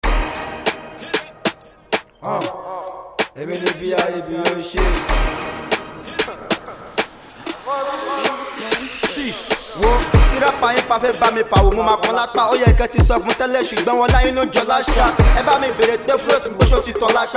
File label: Rap Freestyle